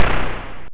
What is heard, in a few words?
Snare, YM, CHIPSHOP HITS DRUMS; ChipShop Deluxe